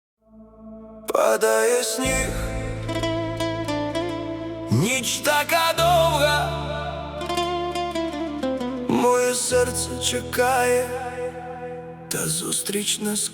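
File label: Ukrainian music for the car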